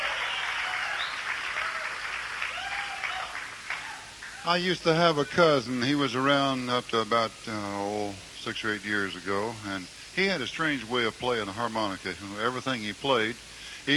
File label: Instrumental; Bluegrass